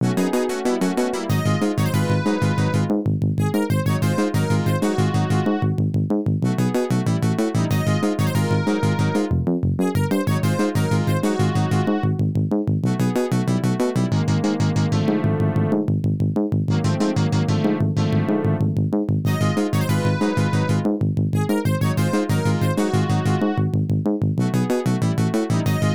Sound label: Unused BGM